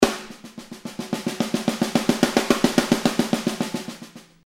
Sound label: snare velocite; toontrack; ezdrummer